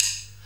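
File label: snare drum rim shot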